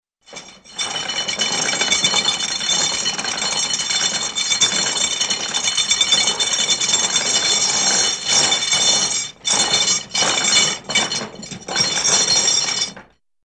Heavy Chain Clanks-like Raising Or Lowering Draw Bridge, cartoon; Hollywood Edge; Cartoon Trax